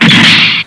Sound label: knife impact; svencoop; scshogo